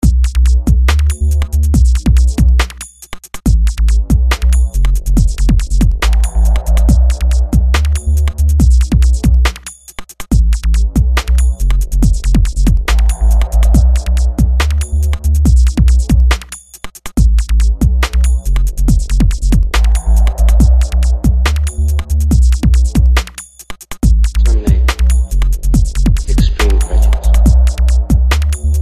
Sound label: dubstep